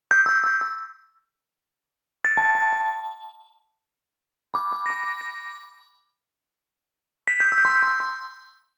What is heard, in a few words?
Arcade Click Neutral; boom library; The Interface